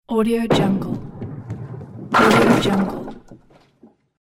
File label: bowling strike